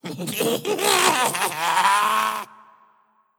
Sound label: Mirro Laugh; Legend of Keepers